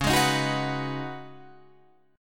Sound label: Db 7th Suspended 2nd Suspended 4th Db, down, Guitar Open F; Db7sus2sus4; strummed